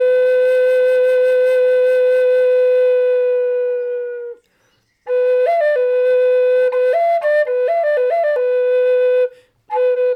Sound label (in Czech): Siyotanka E mol